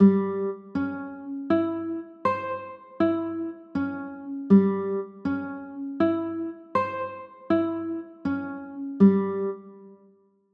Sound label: a sharp 6 9, chords